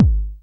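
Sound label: FULL BASS, TECHNO TRANCE; Pro-Rec - Synthsonic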